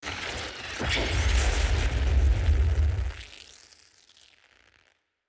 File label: Power Ups Thrust; Headlander